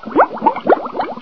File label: healing pond